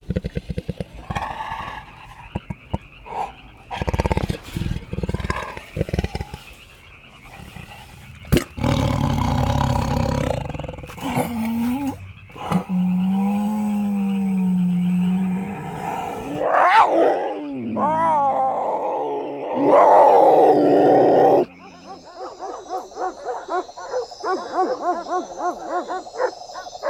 Exotica Animalis; PSE The Producers Library

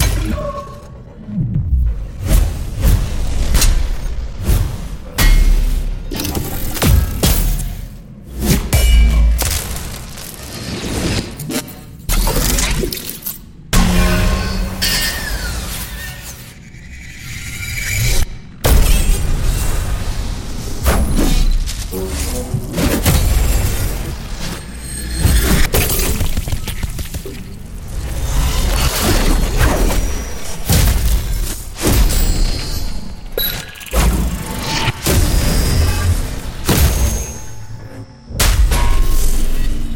Future Melee Weapons; Audio Summoners

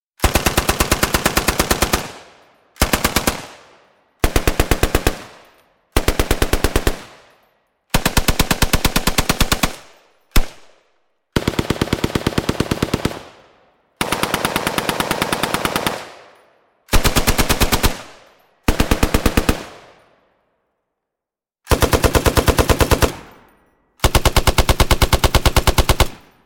m60 machine gun; The Recordist

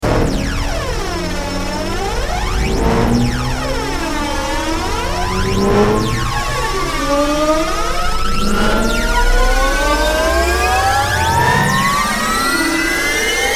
Transitions Uplifting SFX; Image Sounds

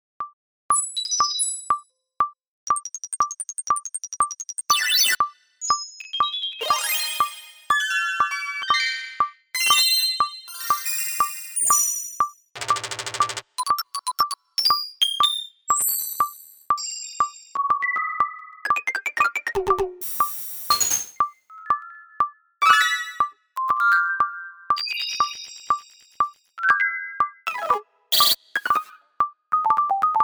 2D Game SFX; Concept Samples

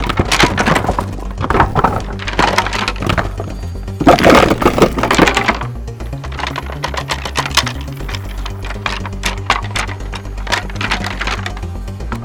Wood; SFXtools

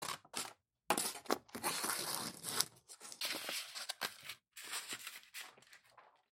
ASMR; Field and Foley; ASMR Essentials

